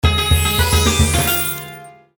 win Slot

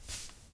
Sneakstep

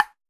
hitsound

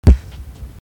kick; stadium

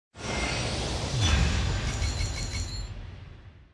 reveal 5 star; genshin impact

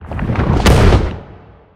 crushing; asgardian stones

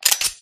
p30l switch, guns; resurviv

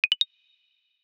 notification success

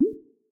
reel Stop; berry burst

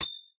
reel Bounce; archangels

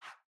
Navbar Dropdown open hover

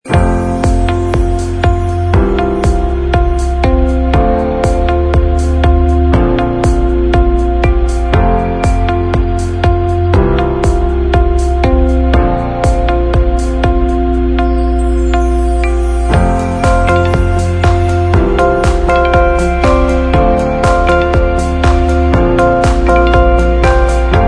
music

